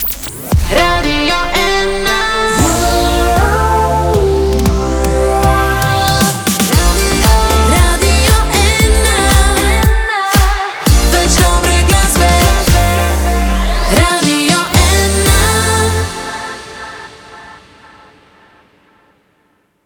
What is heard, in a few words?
Jingle; Radio 1 Slovenia 2022